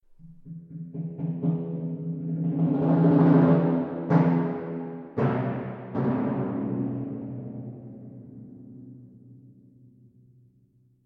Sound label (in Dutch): pauken